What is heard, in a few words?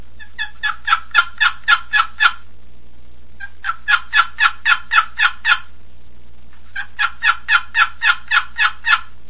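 turkey active monotonous repeating cry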